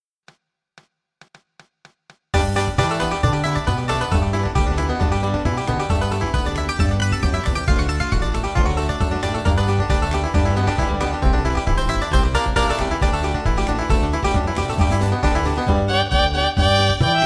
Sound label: Bluegrass Banjo fiddle G; Dixie line